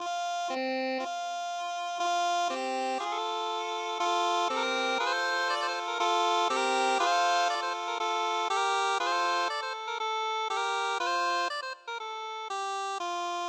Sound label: canon